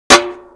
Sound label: hit; Mafie 1